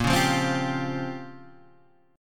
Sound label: A sharp Minor 6th Add 9th A sharp, Guitar chord; A#m6add9 Chord {6 4 3 5 6 3} strummed